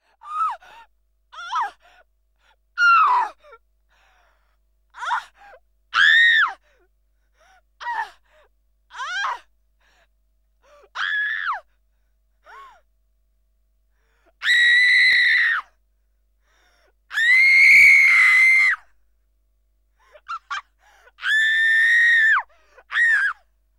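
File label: Woman Screaming; KMP Film Video Sound Effects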